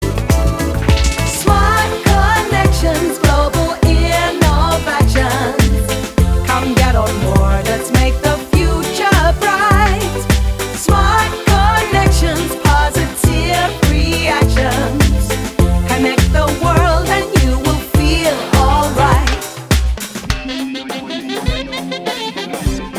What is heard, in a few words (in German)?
klingelton